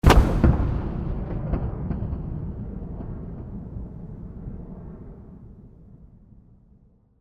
explosion

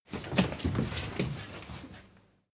crowd sit down

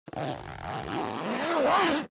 zipper down jacket close

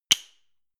click wood generic

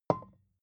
vodka put down

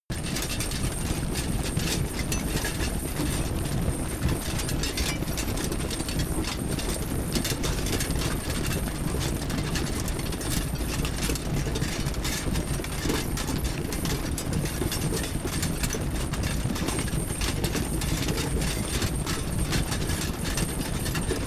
troops leather metal chaos

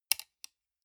sunglasses plastic side piece fold

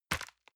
envelope grab hard

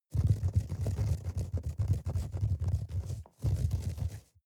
rat run trample fast

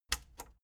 plastic button latch scratch enable slow

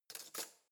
cutlery spoon drawer place soft

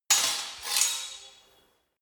sword hit scrape fast sword

